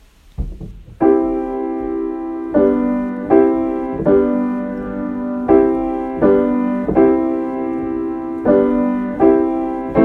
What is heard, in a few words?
instrumental